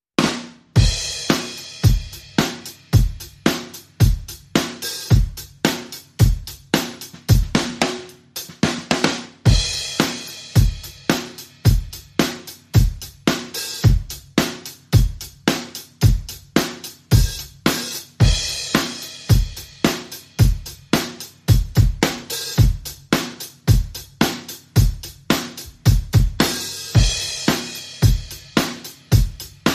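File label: drums and percussion only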